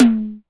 Tom, DIGITAL KIT; Roland MV8800

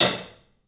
club hat; 3D Sound; 3D Drums

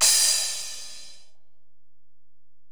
Ride, Alesis DM-PRO, Drum Machines

